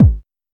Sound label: 2000s perfect kick single shot g sharp key, Royality free kick drum single shot tuned to the G# note